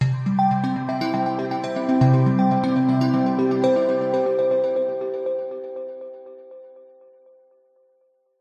logo musical arpeggio percussive bright warm trusted; zapsplat